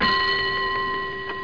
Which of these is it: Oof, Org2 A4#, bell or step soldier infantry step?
bell